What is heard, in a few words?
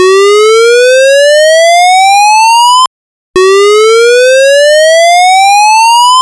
Yelp; ELK-120